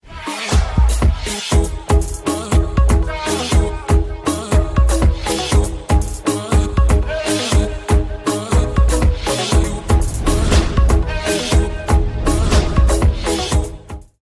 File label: Modern Hip Hop English Ringtone